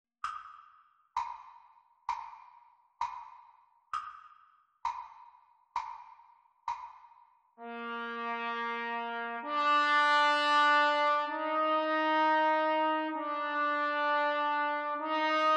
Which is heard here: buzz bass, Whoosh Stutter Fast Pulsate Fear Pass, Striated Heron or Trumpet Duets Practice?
Trumpet Duets Practice